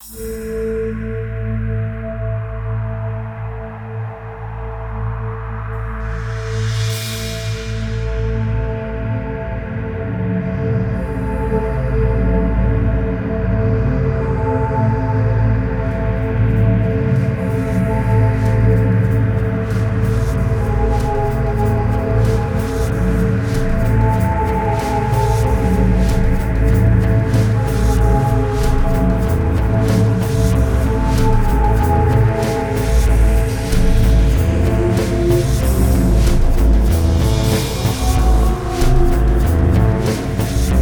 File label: Cave Music loop